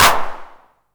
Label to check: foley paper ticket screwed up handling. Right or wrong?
Wrong